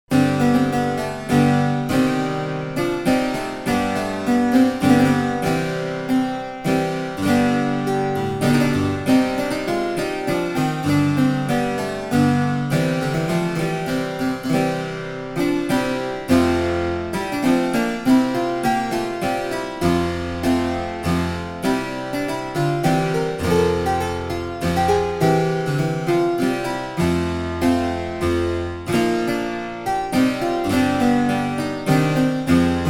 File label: German Harpsichord, 2x8; edition germanisches nationalmuseum; 1738; My Ladye Nevels Grownde; William Byrd